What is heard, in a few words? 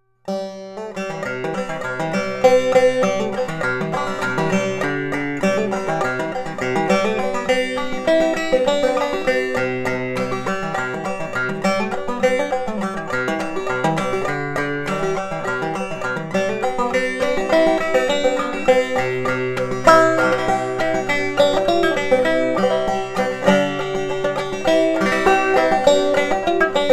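banjo, African blackwood; Soldier's Joy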